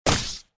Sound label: Sfx Archer Attack Hit